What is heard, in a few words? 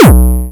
Sqeeze Kick